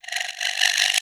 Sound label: PERC, Drum & Bass, 150 BPM; Ueberschall; Drum & Bass Flash Trackz